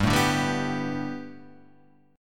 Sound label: A 7th Suspended 2nd Suspended 4th